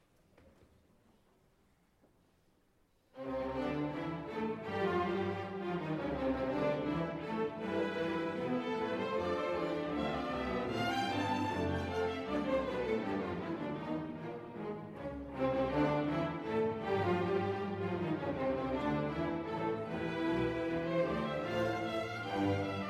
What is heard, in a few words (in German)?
Szenen fur Streicher erster Satz